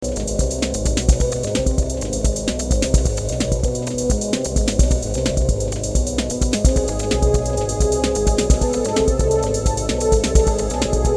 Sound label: blues